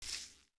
FS Grass, footsteps

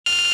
ammo con done, interface

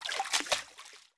FS Water, footsteps

